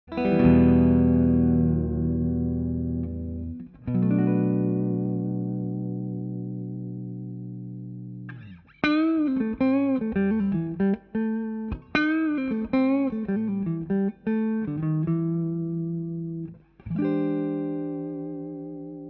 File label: Fig8 E Guitar Clean Solo